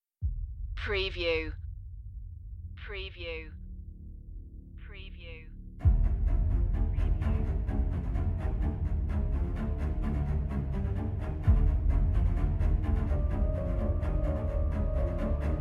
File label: danger, Soundtrack Suspense / Tension, A dark synth and strings ostinati track, creating a myst